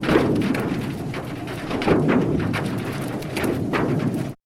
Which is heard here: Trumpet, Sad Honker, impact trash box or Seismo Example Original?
Seismo Example Original